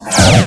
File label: ATTACK